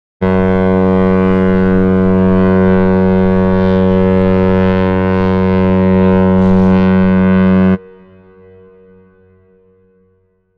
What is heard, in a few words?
big ship horn sound effect